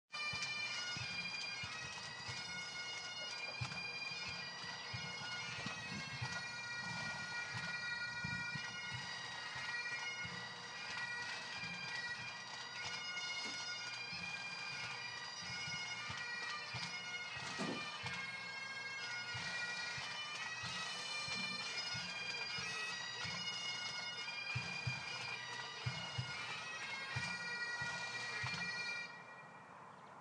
pipers piping plus drummers drumming

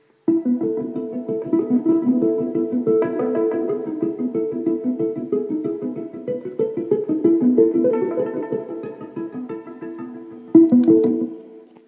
electric cellos

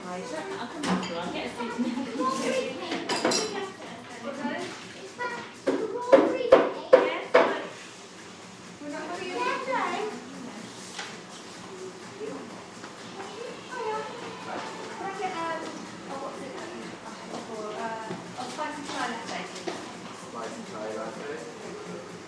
sounds of local coffee shop

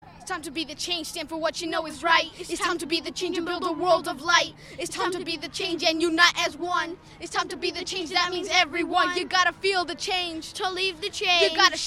rap

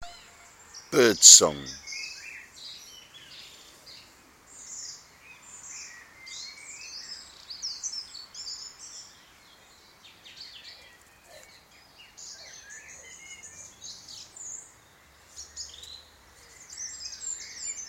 birdsong

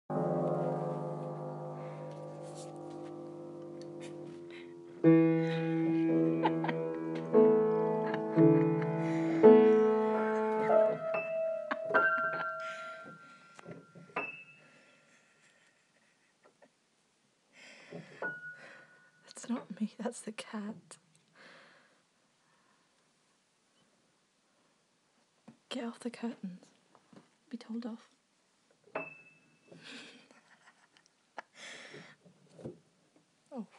cat trying to play the piano

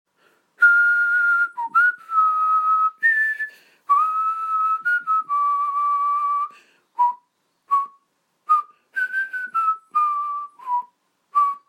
jingle whistled; leicester

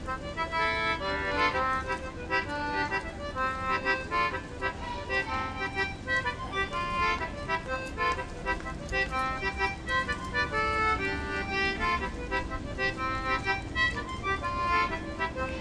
squeezebox busker

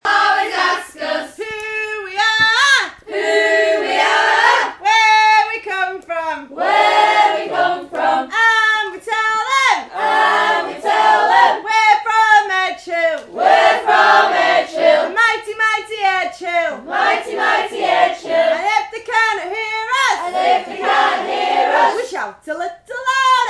camp fire singing

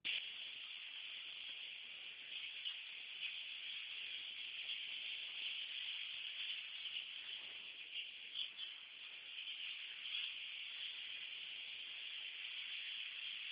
birds tweeting

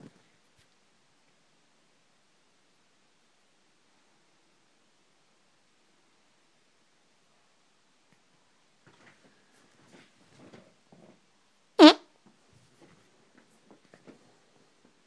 fart

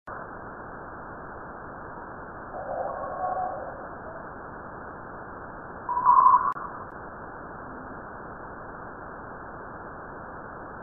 tsunami waves; voyager

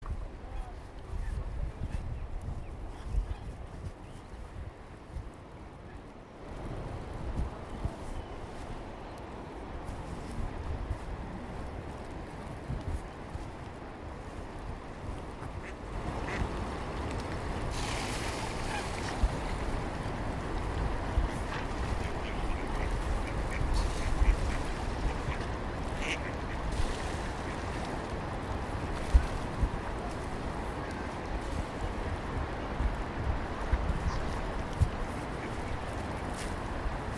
feeding ducks on river thames soundscape only; guidedogvance; rjnet

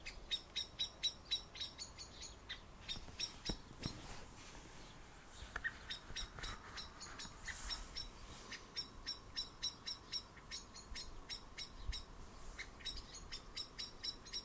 blackbird's magpie alert

